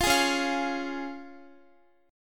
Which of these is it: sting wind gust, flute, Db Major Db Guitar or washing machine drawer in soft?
Db Major Db Guitar